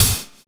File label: Hi Hat; Sonic Foundry (Sony Creative Software); Drums & Drones; Construction Kits; Push Kit